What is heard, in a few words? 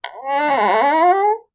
household door creak classic; zapsplat